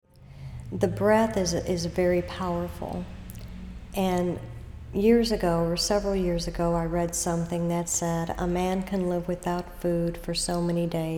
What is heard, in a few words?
Yoga breathing